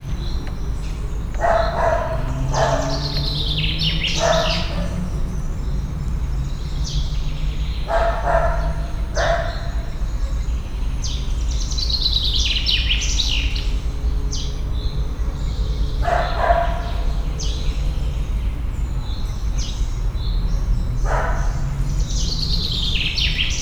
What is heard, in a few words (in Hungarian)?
távolról az erdőnát, mudi; szegedi zoo 2011